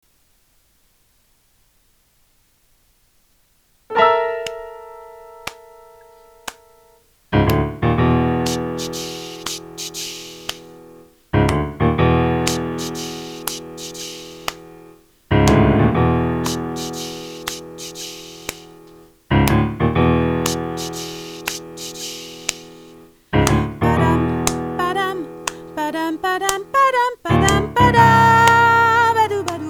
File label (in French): soprano panthere partie 2